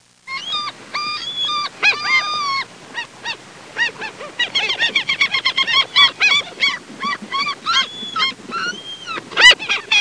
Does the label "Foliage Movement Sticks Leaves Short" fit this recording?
No